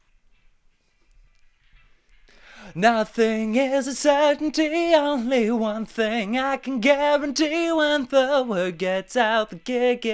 vox; logic

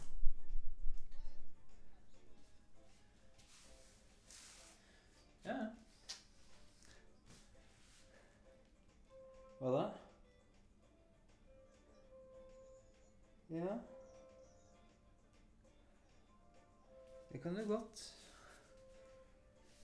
flute

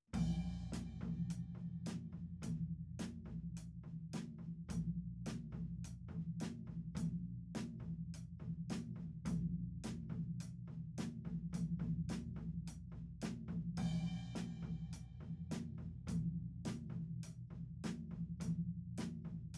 tom; logic

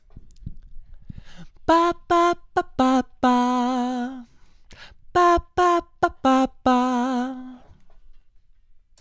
vox; logic

